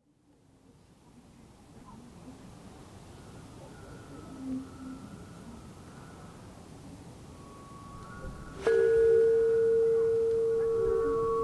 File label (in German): windspiel windschutz GONG HARFE WENIG; outtake